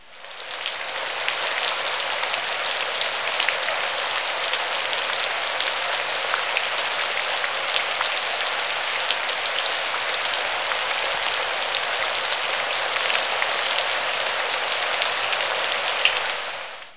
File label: rain